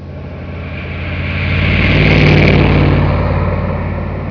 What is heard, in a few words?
bigrig passby, truck; Big rig passes by